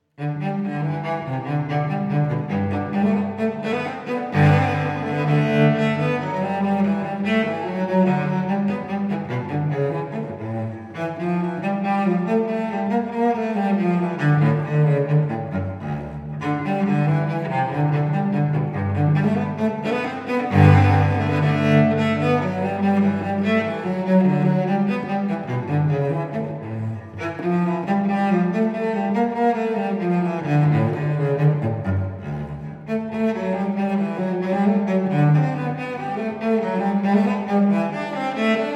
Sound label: Suite in G Major, Gigue; Suite No. 1; BWV 1007